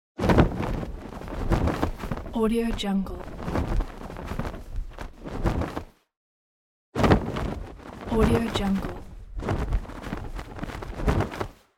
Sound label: flag